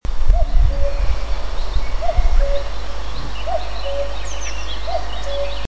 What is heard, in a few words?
cuckoo